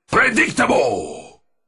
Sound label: Geese